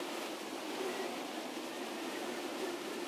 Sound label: Flying Javelin Projectile